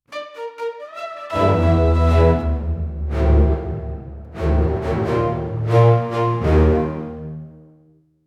cinematic orchest; thriller

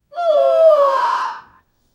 crowd disappointment coll, game show

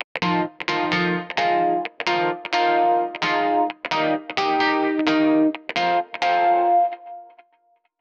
midwest emo guitar loop

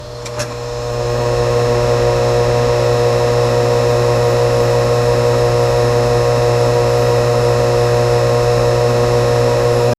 a short metallic generato, cranking

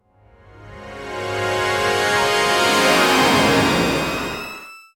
oceanic ascension fanfare

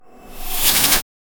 videogame spell casting

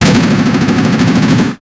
machine cannon reloading

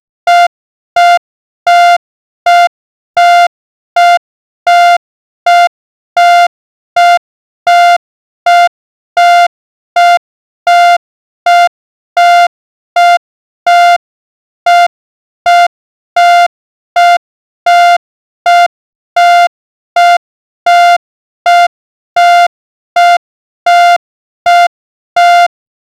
make a very loud alarm, obnoxious

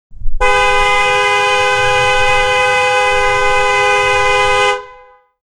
peel p50 car horn; horns

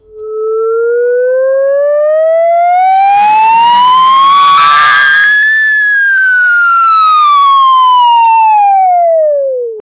slide whistle up or down

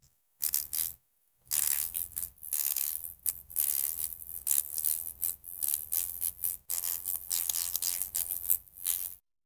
beetle steps

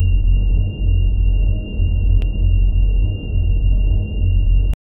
low engine rumble whooshi; synthwave

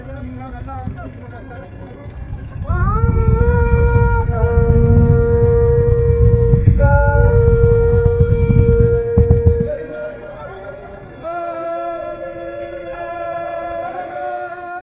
islamic melody slowly bui; events

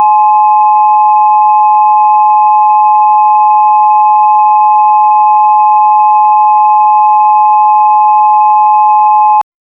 a short public transport, modern

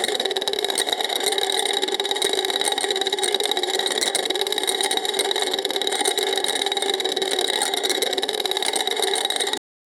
machines

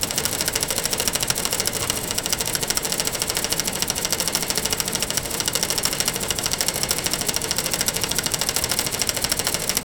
a lifelike sewing machine; sound effect

